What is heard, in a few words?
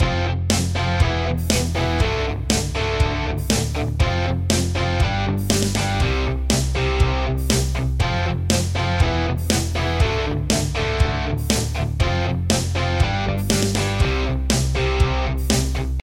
Dad Rock Guitar Beat